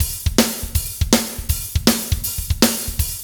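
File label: fast shuffle, Roland drum beats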